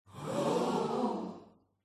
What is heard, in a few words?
small female group expressing admiration saying ooooh in unison; Sound Ex Machina